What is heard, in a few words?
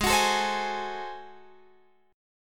G sharp 7th Flat 9th G sharp down Guitar Open F, chord; G#7b9; strummed